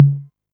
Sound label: tom mid, Novation Drumstation